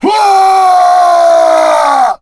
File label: death